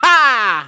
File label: wah; luigi; sm64coopdx